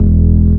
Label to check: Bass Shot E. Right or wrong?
Right